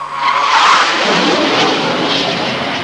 F 15 fly, transportation; Web Clip Empire 150,000

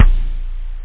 rap kick; OctaMED SoundStudio V1; jazzies samples; perc

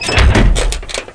SUSPEN; mtm2demo

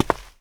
ladder step; wob2 demo

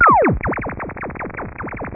Beam laser; Risc World 8; SF3000

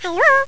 hihoo

